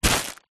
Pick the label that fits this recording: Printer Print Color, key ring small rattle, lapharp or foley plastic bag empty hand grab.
foley plastic bag empty hand grab